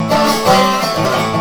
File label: dobro danger